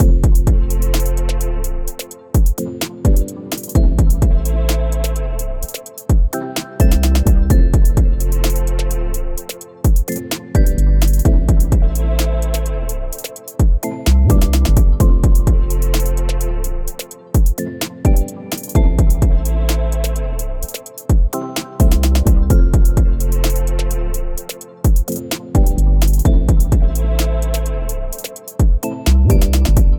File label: Beat 128bpm Am Melodic Layered Trap